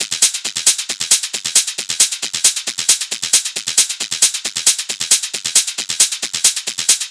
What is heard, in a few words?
Hat Loop 135, Techno; Loops; Drums; HiHats; Ghosthack; AC2024 - Day 1 - Techno Sounds 2025